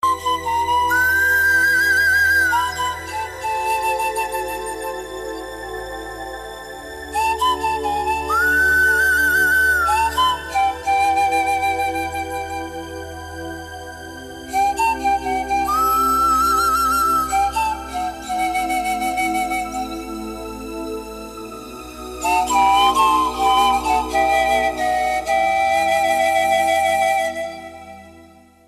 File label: Flutetone